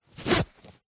foley clothing jeans denim pants trousers fly zipper; zapsplat